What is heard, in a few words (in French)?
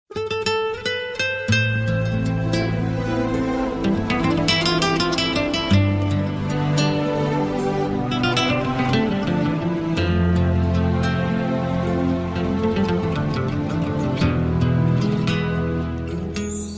guitare espagnole